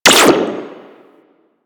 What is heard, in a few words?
Sentinel Laser Rifle Fire; DE; Warframe; Weapons